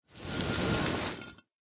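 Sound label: movement drawline; Smite; Cabrakan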